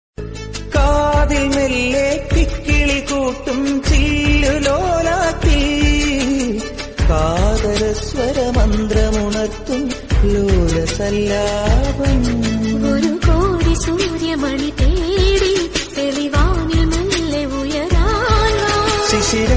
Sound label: malayalam romantic song